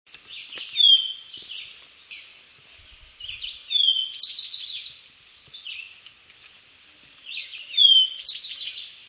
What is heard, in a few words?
towhee